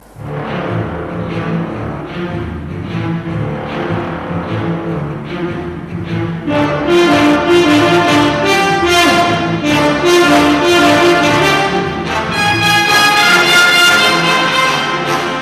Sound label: Symphony Music